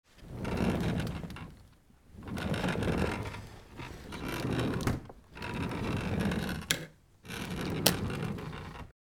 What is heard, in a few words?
Dragging a rolling chair